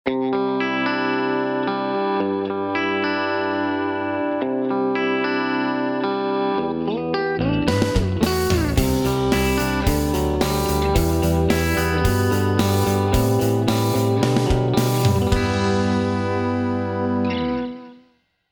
Duesenberg Outlaw MXR Dyna Comp Boss DD20 Vox AC30HH2 EVM12L Black Label 16 Ohm; The Gig Rig Midi8